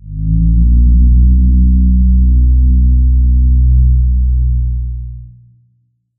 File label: organic moan, ambient